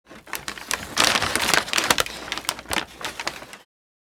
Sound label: Christmas toys wrapping paper long; gfxsounds